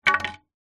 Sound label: boat wooden oar old down on hard ground